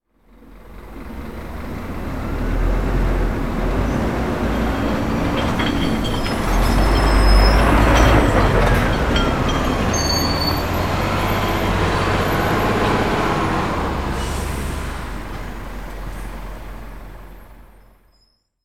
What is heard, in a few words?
Garbage truck moving back and forth; construction vehicles